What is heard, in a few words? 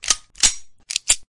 gun reload